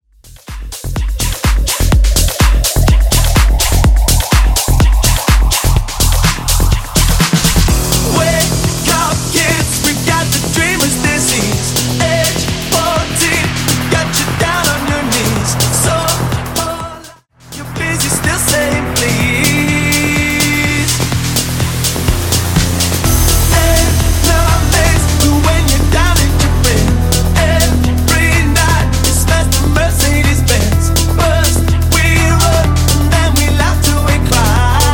House Mix, 125 bpm

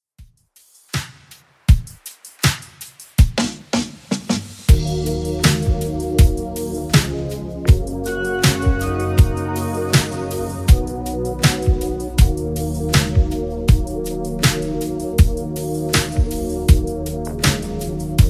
80s Redrum 80bpm

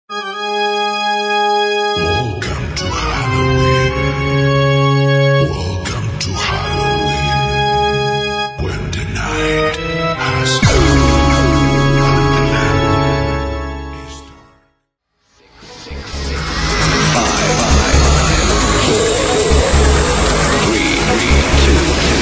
Halloween

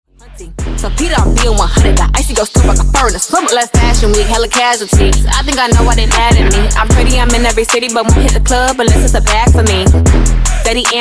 76Bpm; Dj Intro Outro

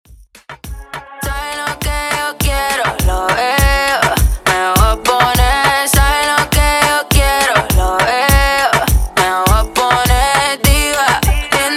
Latin Moombah Re Drum 102 BPM

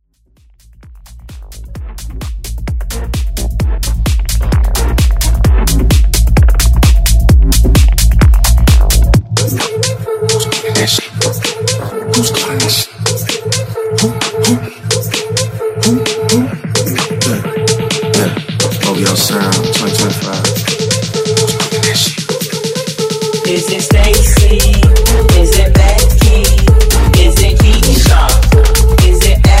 Mash Up

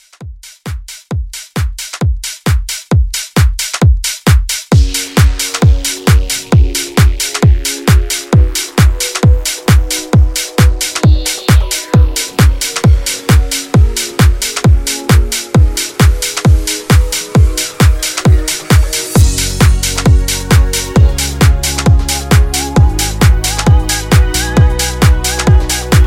90s Pop Re Drum 133

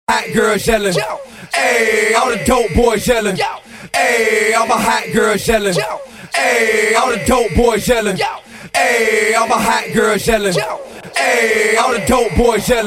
Acapela Hype Intro

